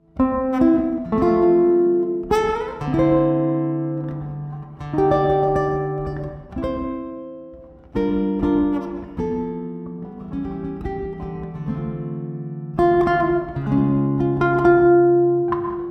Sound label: Jazz, classical guitar